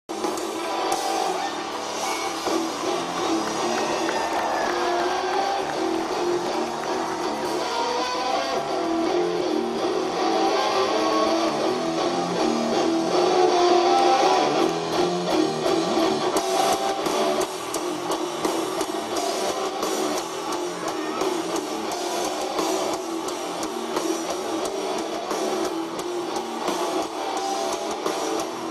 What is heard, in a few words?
riff